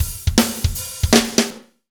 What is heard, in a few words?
Fast Shuff, Drumscapes Roland; Northstar